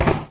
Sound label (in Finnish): vesipullo, Clangy Crunchy Foundsound Kit